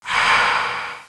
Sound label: ghoul recover; cstrike